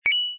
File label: get Coin